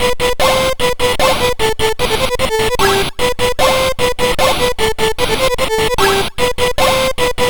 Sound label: Intro Tune; MOS6581R4